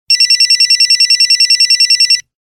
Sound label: Cell ring